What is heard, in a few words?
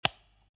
button percussion